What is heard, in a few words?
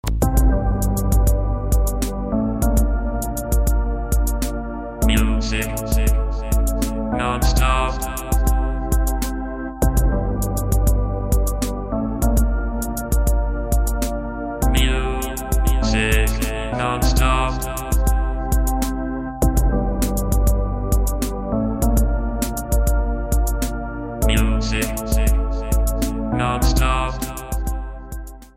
music ringtone